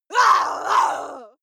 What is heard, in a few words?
female lunatic scream; menschen; schreie